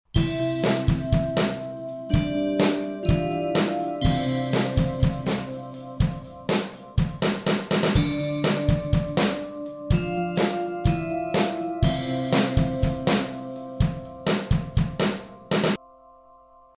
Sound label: new delay; 8th note delay on the EP